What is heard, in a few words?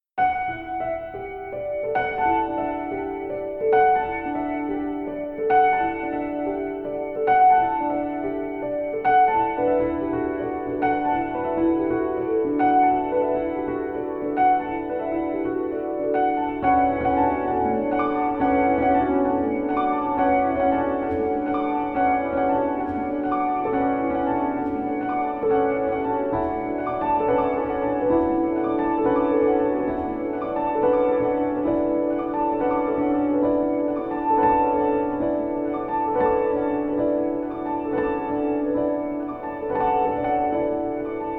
Bird Ensemble